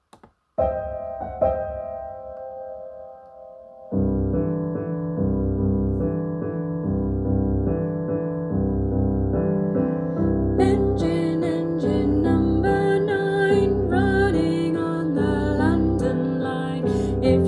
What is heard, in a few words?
engine engine song with piano; In Harmony teacher resources; EYFS and Key Stage 1; SONG WITH ACCOMPANIMENT